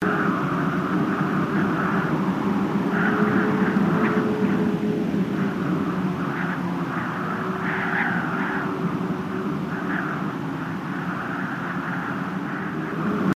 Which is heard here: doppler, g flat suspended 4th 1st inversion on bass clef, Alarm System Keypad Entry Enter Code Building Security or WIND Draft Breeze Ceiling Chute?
WIND Draft Breeze Ceiling Chute